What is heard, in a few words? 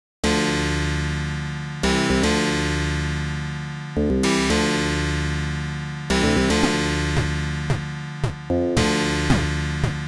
pc88 dance opn; weeklybeats 2024